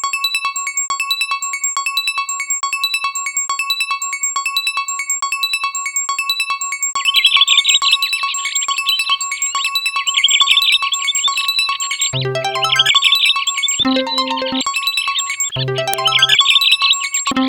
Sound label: Harp Short; Grive